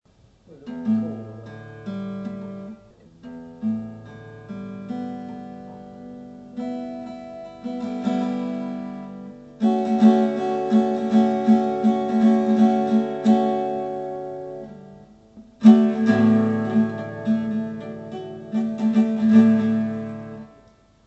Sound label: guitar